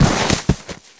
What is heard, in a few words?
foley slide, skater; Tony Hawk's Project 8; XBOX